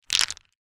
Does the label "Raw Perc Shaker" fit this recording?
No